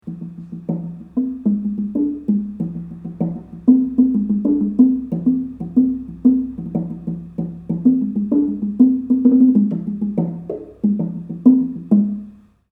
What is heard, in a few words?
box drum